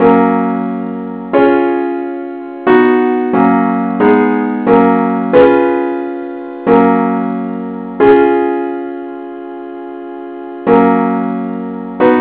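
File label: simple Acc key f sharp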